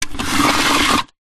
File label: household washing line retractable pull extend plastic housing